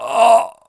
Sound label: death; mohaa; dialogue